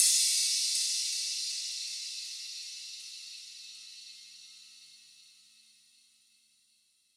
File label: crash; eddyflux